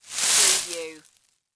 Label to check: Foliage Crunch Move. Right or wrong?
Right